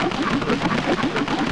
Wizrobe Dance; Zelda; MM

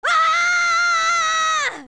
young scream; Link screaming during a big fall

